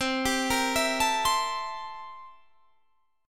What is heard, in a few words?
C 13th C down Guitar Standard; C13 arpeggiated